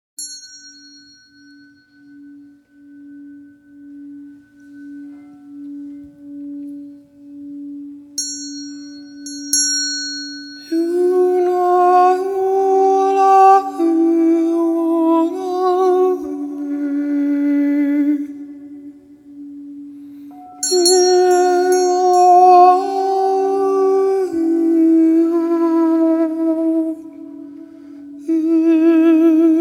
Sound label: Sound Meditation